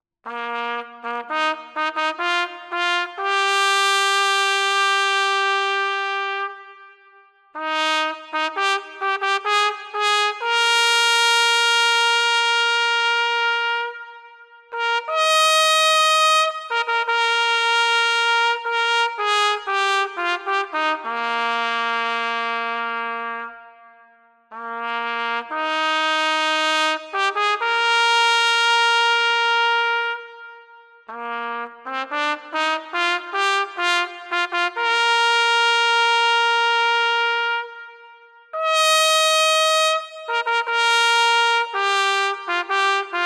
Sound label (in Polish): hejnal miasta; nowe skalmierzyce